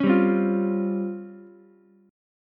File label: C sharp Major 7th Flat 5th, C sharp, Ukulele; strummed; chord